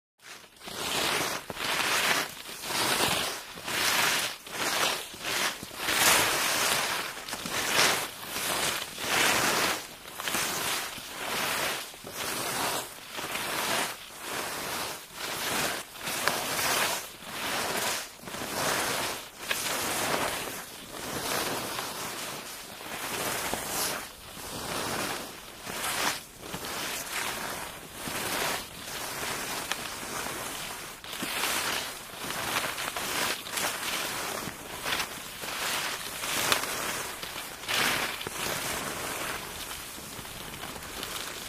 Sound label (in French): musique